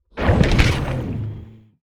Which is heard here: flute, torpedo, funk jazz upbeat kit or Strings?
torpedo